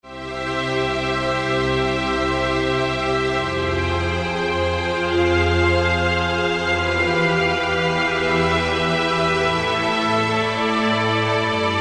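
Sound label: Orchestral strings; Best Service Gigapack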